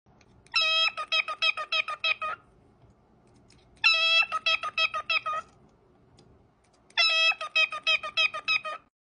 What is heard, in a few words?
Cockatiel; صدای کوتاه عروس هلندی